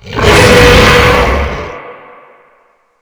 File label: shout boss; thor